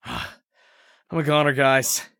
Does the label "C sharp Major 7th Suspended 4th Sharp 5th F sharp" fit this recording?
No